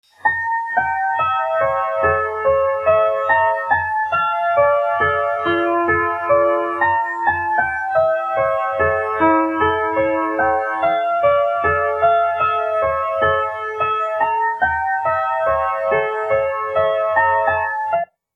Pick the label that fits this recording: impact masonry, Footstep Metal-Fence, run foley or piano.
piano